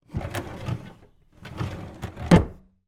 office wooden file drawer slide open then close hard